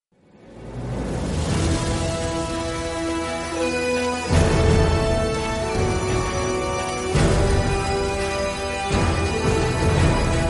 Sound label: menu music; witcher 3